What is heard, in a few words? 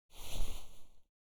Mp5 Draw; zps; Weapons; Firearms